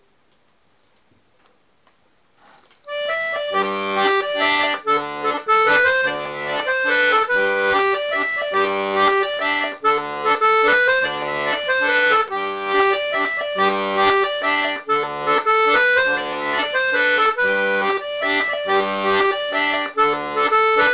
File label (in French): Mazurka